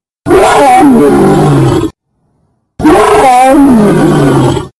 LION ROAR

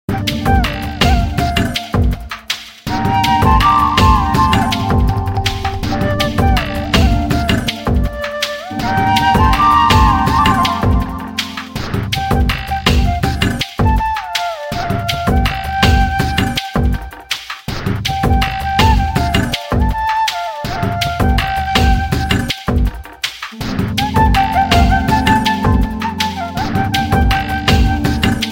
instrumental ringtone

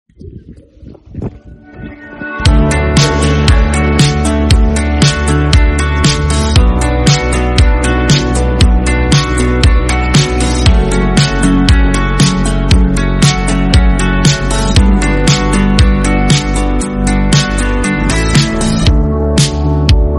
INSTRUMENTAL RINGTONE

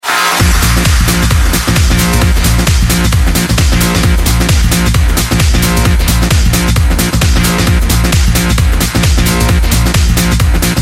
progressive trance mood ringtone

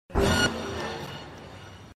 ALERT RINGTONE; CYBER PUNK 2077

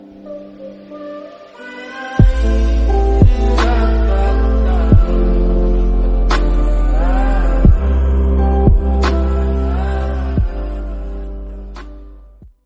instrumental ringtone

